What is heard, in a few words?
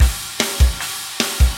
metal